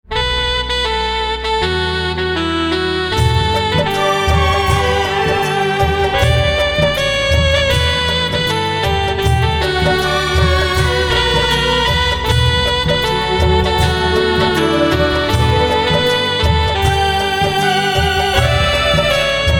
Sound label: Saxophone